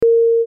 tiếng chuông kêu tút hết thời gian ngắn

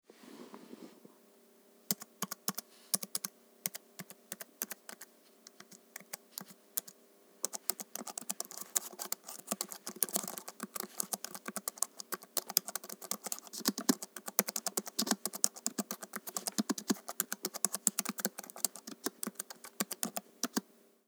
Am thanh danh ban phim may tinh macbook

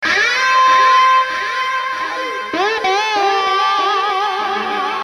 Hieu ung tieng guitar vang doi

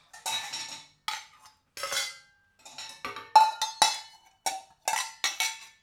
Tieng va cham cac vat dung trong bep

